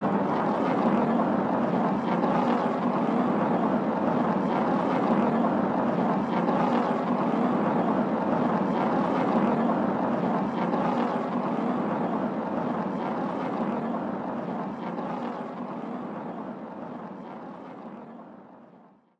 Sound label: Player Hand Slide Carving Very Fast